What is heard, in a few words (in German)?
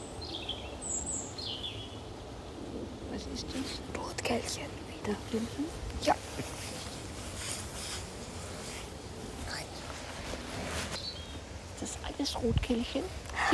rotkehl kohlmeise; vogelkunde